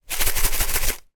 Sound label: animals fish food flakes in plastic tub shake